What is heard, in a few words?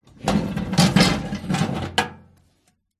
household microwave oven glass plate turn table remove; zapsplat